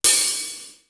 BD AND HAT OP; East West; Global Sounds

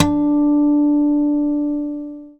GUI A CHAR D, Acoustic, Roland

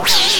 SPIN, anime sound fx, comic

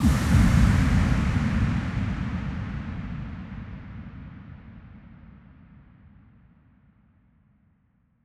Huge Verb Tail, Long Reverb Tails; impact samples

